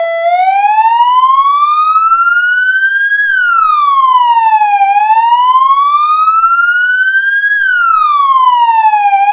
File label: wail, sirens; federal sig 650